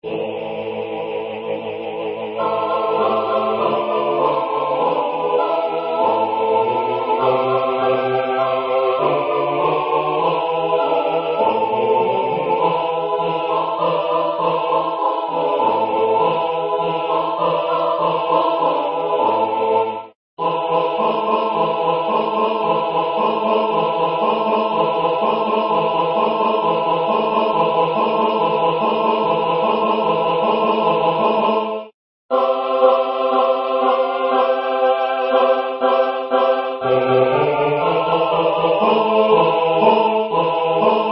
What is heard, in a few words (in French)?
choeur